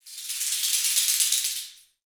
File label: toy, metal jangle, perc fx; Roland LCDP14 Africa VOL-2